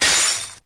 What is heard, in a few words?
glass; jokingyou